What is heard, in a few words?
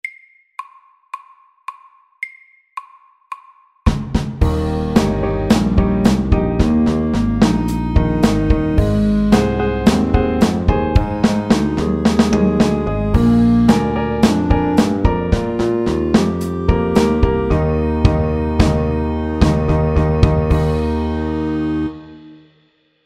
Clarinet blues MEDIUM 90